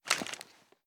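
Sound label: pistol reload empty fast raise; gmod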